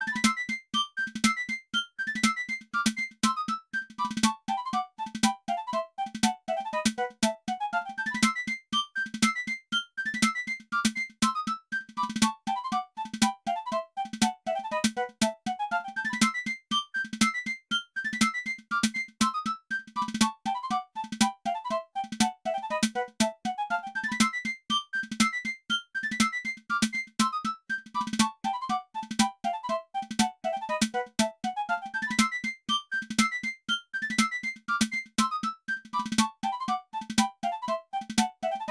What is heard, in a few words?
music